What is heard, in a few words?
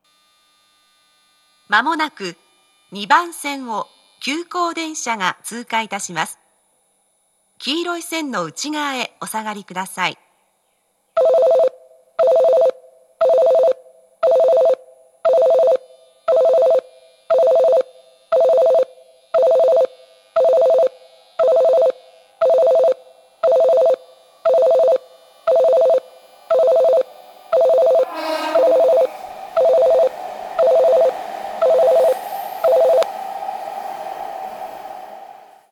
pass express; tammachi; tokyu-corporation